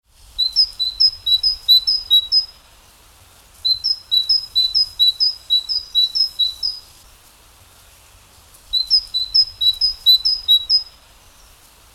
Kohlmeise Rufreihen; Günter Tembrock; Vogelphilipp; Vogelstimmen

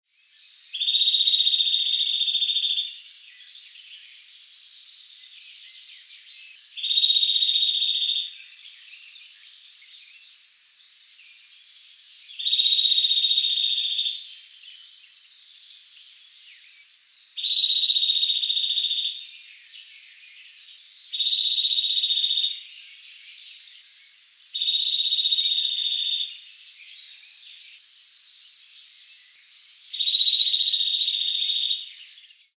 Kleiber Gesang Trillern; Karl-Heinz Frommolt; Vogelphilipp; Vogelstimmen

